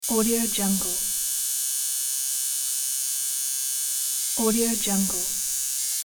tattoo needle

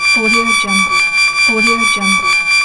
old alarm loop

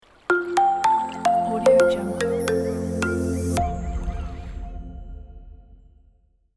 corporate logo

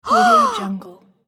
female shocked gasp

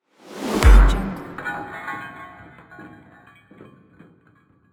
dark metallic sweep

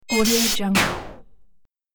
robot malfunction; sonic-boom

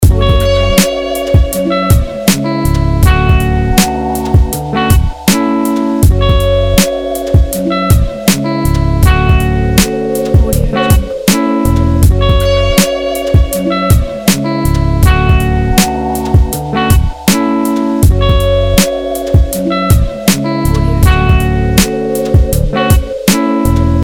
sweet guitar hiphop loop

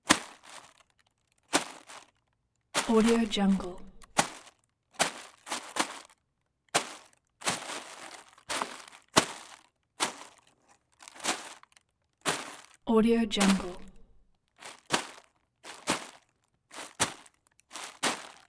tissue paper impact

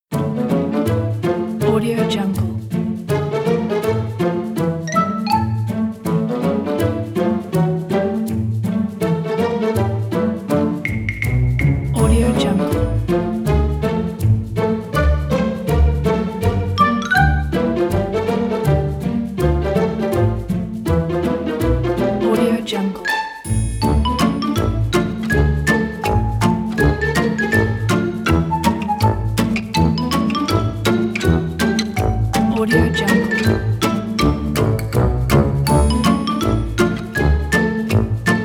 for cartoon music

